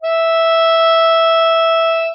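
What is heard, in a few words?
harmonica note C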